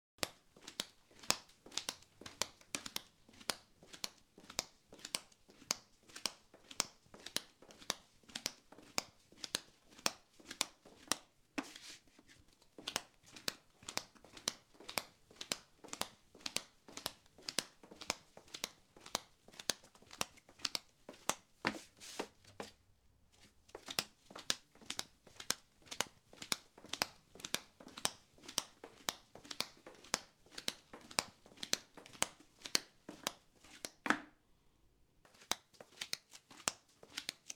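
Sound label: footsteps in slippers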